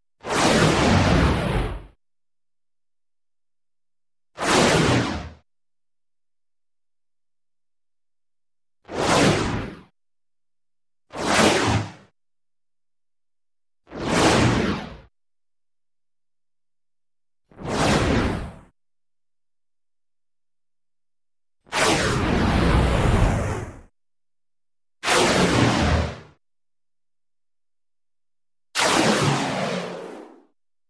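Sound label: Flaming Debris Flyby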